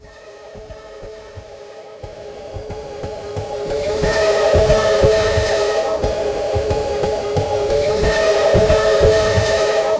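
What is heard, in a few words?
immersive roller coaster; amusement park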